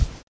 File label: Smack; Lewd SFX